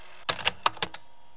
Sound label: kacha